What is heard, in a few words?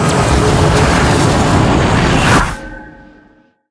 intro head start